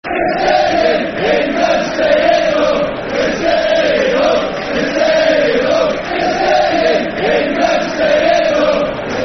Staying Home Football Chants (Soccer Songs), Cardiff City; fanchants-com